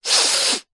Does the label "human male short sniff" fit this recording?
Yes